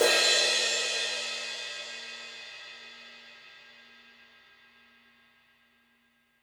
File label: Crash Crack, Cymbal, Drums; One Shots; Ableton Live 12 Lite; Core Library